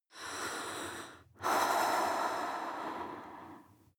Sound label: breath female normal sequence